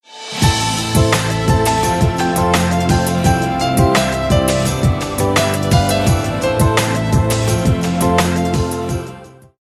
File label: sony whisper; ringtones; sms